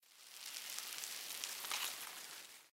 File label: Rock; Blind Quest - The Frost Demon